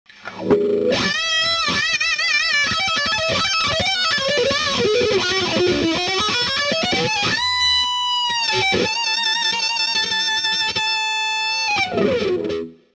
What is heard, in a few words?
wah, effector; Budda Budwah